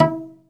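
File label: SOLO CELLO, Synclavier Strings; ILIO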